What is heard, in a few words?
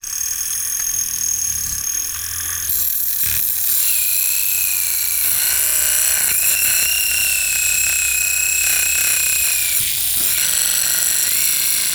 Electronic Servo Motor Little Servo Motor Grinding; AdobeCC